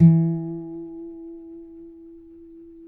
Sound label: Finger E 3 R, Guitars; Spectrasonics; Hans Zimmer Guitars VOL-1